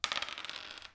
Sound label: Dice